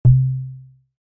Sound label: Rollover, Short; Mouseovers; Multimedia; Stuart Duffield